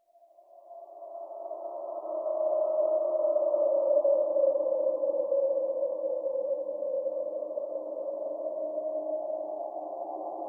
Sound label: eerie wind; Rumbles